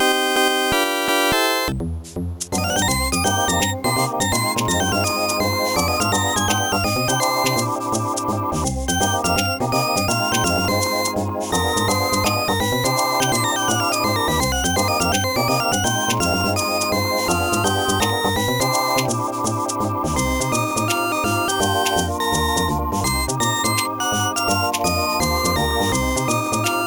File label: mod jazz festival; TP94